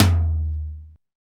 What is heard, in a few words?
TOM TOM